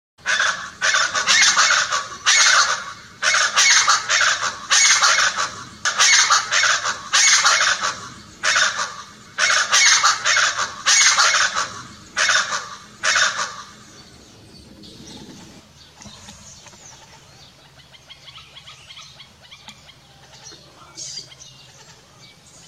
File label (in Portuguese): canto do aracua escamoso